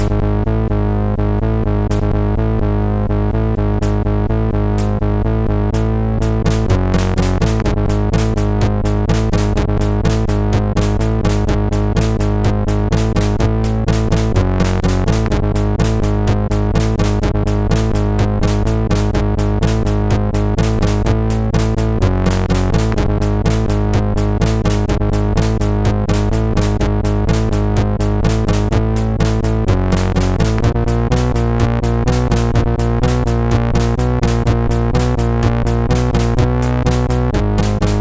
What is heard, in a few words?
Hard Break, MOS6581R2; ASL